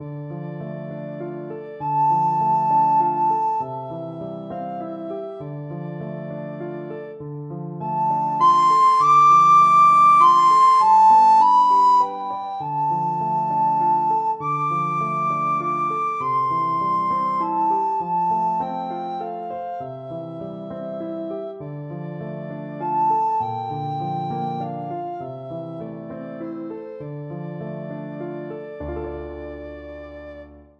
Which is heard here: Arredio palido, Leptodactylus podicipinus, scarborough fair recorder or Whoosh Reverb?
scarborough fair recorder